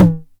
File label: 909 MT Tape, Toms; Goldbaby Tape808 & Tape909; The Tape 909